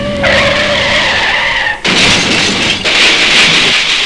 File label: wreck